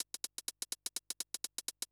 HIHAT HOUSE 125, Drum; eJay

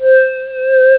asia, synth chords; OctaMED SoundStudio V1

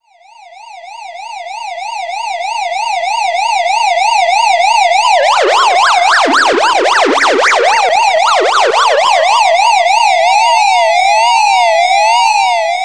heli; HELICOP

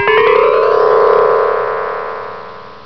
Funky Bells; HyperStudio; Roger Wagner Publishing

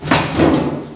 door close; DevilDarts 2.0 FAT

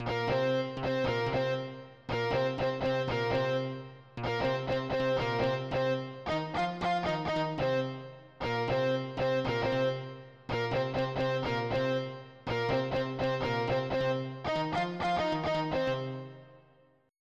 tweet

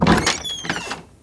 door open; DAoC

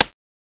wood chop; BigMax nº 3 Curso de Word 7

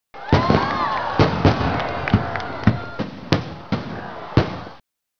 firework; Adoption MacroWav Library; Chat

